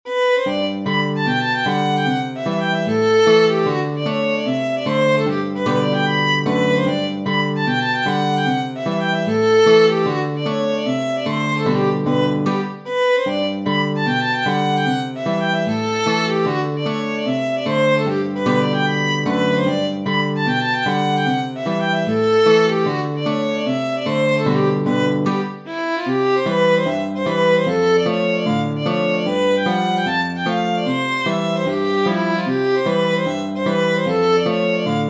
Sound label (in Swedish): Schottis